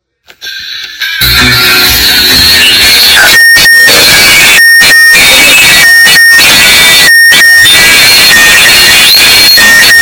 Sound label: Mazda louder chime